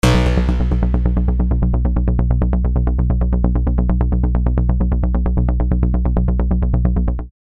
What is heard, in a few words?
BA Random Bits; Vengeance Sound Avenger 2; Generative 1